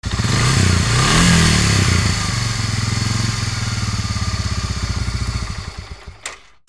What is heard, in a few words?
VEHICLES